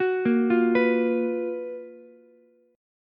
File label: Gb Major 11th, Ukulele C6; arpeggiated; 11,10,13,14; JGuitar